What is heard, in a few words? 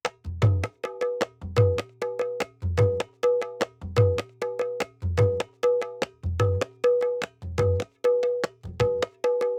Khool Loop BPM 100, Assamese folk; Gully Loops; waves of bihu